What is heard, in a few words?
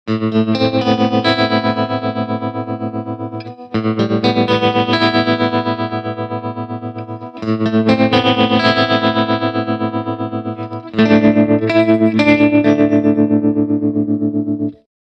tremolo